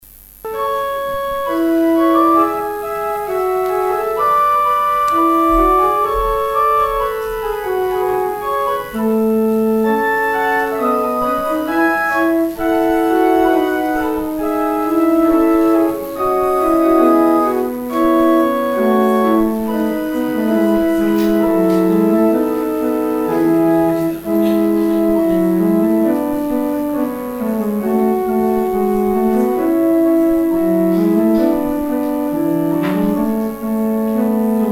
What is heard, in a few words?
organ interlude; Fughetta in A - J.C. Kittel; St. Mungo's